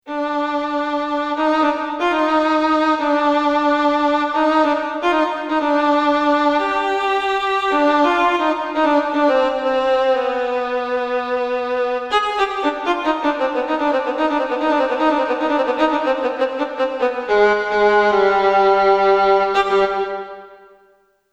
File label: Sad Solo Vln; xp-80; roland; SadSolo Bln patch demo; roland demo cd-3